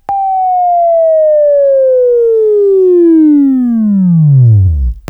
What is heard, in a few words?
WAV Left, Sweeping; Sweeping Training program